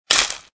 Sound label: Single Booster; unity